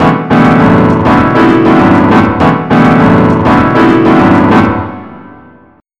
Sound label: BOSSA; BUSKER L